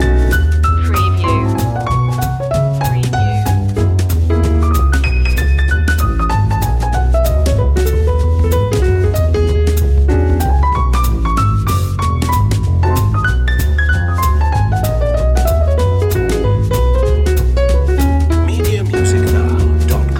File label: tip tap loop